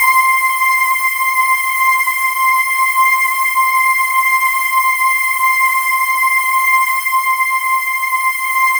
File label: C6, Synth Leads; 90 s Sample CDs; NiceBeats (NiceBytes); Studioline 09